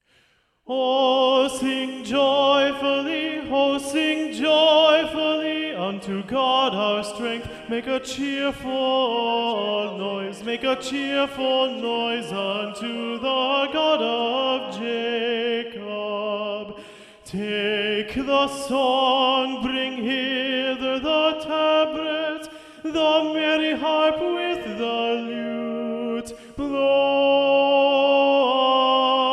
o sing joyfully tenor predominant; batten; schola

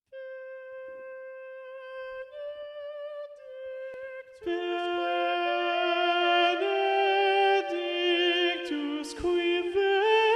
missa brevis benedictus alto predominant, schola; palestrina

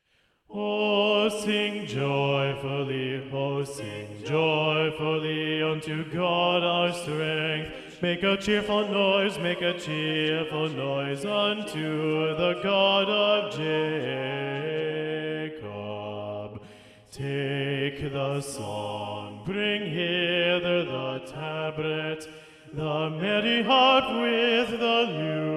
o sing joyfully bass predominant; batten; schola

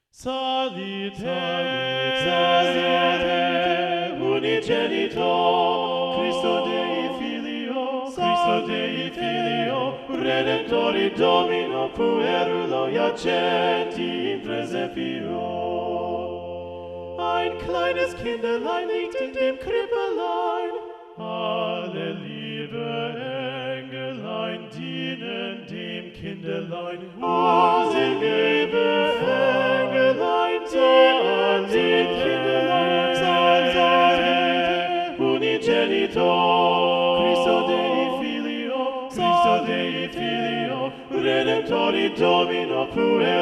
balanced voices; psalite unigenito singt und klingt; schola

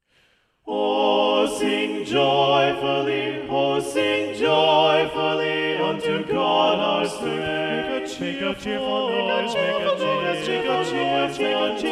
sing joyfully balanced voices; batten; schola

